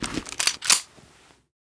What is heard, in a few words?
sawnoff shotgun deploy; Rust